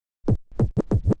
OLDSCHOOL SCRATCH 96

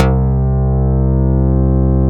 BASS MONO

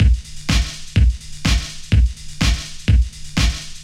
DEEPHOUSE BEATS 125

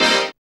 dance chord

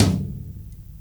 tom mi, acoustic drum

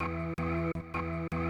Industrial 160

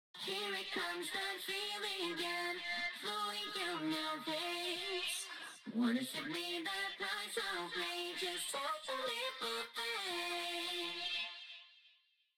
Vocals BG Premixed

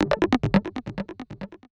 FX SHOT TEKNO 140; Techno2; Singleshots

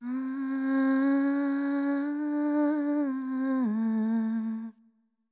ETHNO FEMALE, Vocals; ethno2

